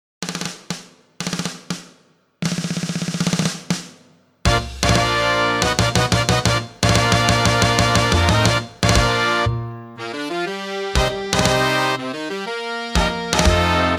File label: Fanfare; 20th Century Fox